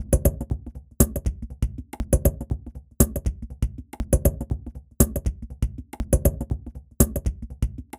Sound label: Perc Loop 120BPM Machine Synthetic